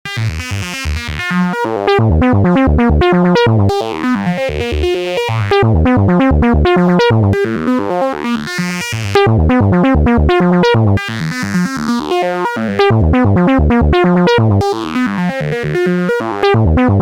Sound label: 700 filter, DUAL 2:1 VOLTAGE; synthtech; motm 700